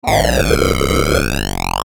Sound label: Descend Ascend Flange Buzz; Descending Sound Effects; Blastwave